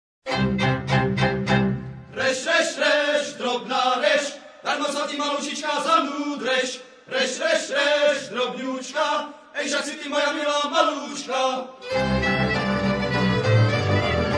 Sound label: cimbal